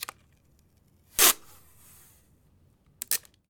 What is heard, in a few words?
SEL TAPE, STATIONERY